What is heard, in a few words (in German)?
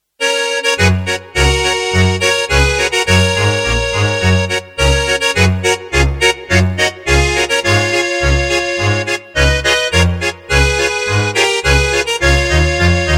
Guetsch Marsch